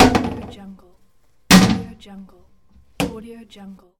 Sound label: toilet seat bang